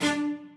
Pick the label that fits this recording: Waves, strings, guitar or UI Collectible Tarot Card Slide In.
strings